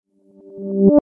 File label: join; reverse